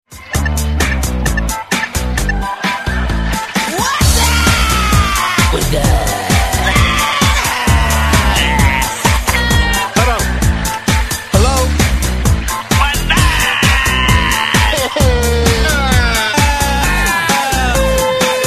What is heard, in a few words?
Whatsapp musical, Ringtones Graciosos